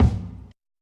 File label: Nut Kick